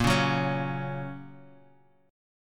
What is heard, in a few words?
A sharp Minor Sharp 5th; 18,16,16,18; strummed; JGuitar